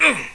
Effort Sound; Realmz 6.1.3; The Family Jewels

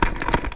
move

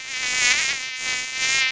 MOSQUITO; animal fun

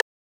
plink; 808

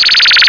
High Blast; CephaloTron

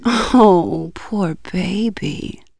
VX BABY; REDNECK; RAMPAGER

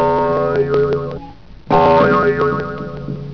spring; Easy Personal Web Page

